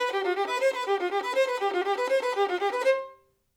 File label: violin